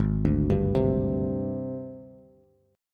Ab Major 7th Flat 5th Ab, down, Bass; arpeggiated; JGuitar

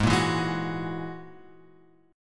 G sharp 7th Suspended 2nd Sharp 5th; G#7sus2#5 Chord {4 1 2 1 X 2} strummed

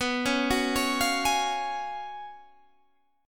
F sharp Major 7th Suspended 2nd Suspended 4th, B

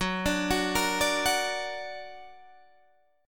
F sharp Augmented F sharp Guitar Standard; F#+ arpeggiated; 14,17,16,15,15,14

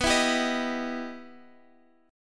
E Minor Major 7th B

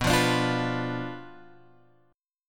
B Diminished B down Guitar Open G

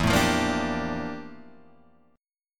F Major 13th E, guitar chord

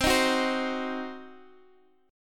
C Diminished, chord; strummed; JGuitar

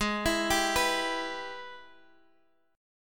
Minor Major 7th Sharp 5th; G#mM7#5; arpeggiated; x,x,6,9,8,7

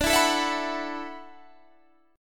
E 9th Suspended 4th D, guitar

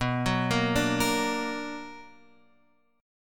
B Minor Major 7th, Guitar, Open F; arpeggiated; JGuitar

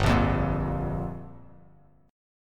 G Minor 7th; Gm7 strummed; JGuitar

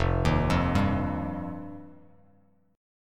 F 7th Suspended 2nd, Bass Standard; F7sus2; arpeggiated; JGuitar

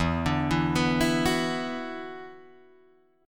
E 7th Suspended 2nd, Guitar, Drop D; chord shape

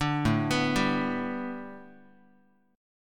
A 7th Suspended 2nd Suspended 4th, Guitar; A7sus2sus4 arpeggiated

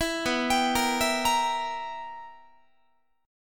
B Major 7th Suspended 4th Sharp 5th Guitar, chord; arpeggiated

